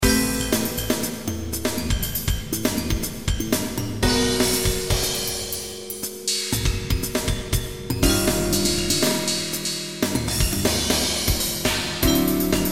Chords And Bass

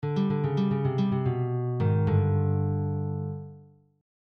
Blues ending; Blues Exercises

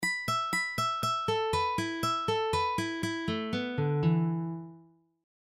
E minor Tapping Exercise; Tapping Licks

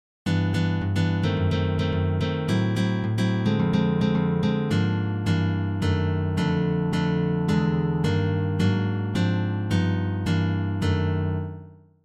BRAZILIAN VAMPS AND TURNAROUNDS

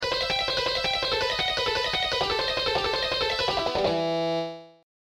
Shredding Exercise

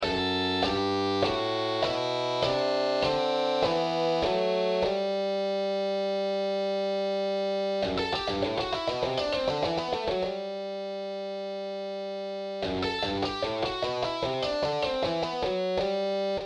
CONVERGING SCALES

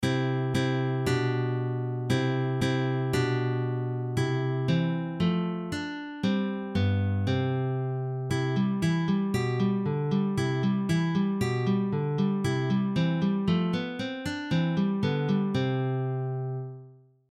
Allegretto in C

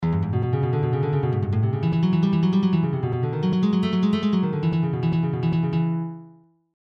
Finger exercise; Cool Exercise; Left and right hand exercise

